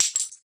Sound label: glass breaking; breaking falling hit sfx